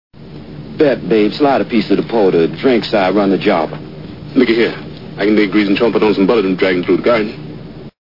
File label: jive